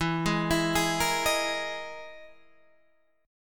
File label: E Major 7th Flat 5th E, Guitar, Drop D; chord shape